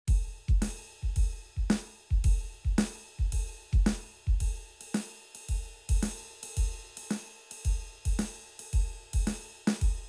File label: Yamaha FGDP-50